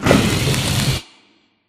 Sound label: Small Concrete Wall Start; Boomtown; Titanfall 2